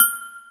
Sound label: change, interface; redeclipse